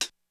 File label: HihatCl, Korg DSS-1